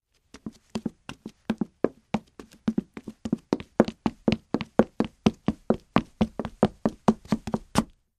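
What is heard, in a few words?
Footsteps Female Run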